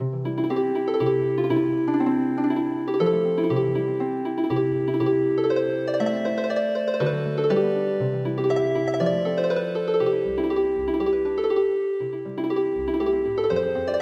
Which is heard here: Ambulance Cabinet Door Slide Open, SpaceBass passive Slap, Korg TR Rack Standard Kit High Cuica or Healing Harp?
Healing Harp